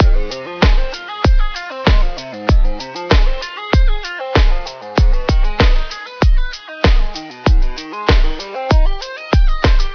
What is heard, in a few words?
game music; sfx; cosmic protector